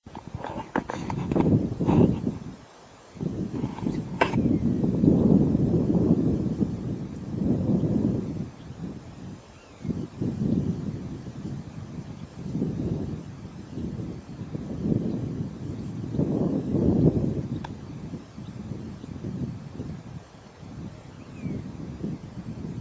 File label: allen flight calls